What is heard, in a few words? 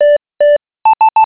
beep end; dtmfbox